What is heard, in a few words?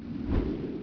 windmill; Soultree